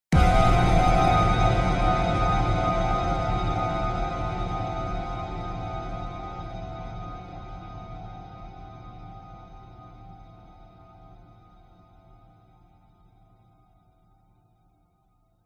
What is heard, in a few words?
horror jump scare bright hit discordant long tail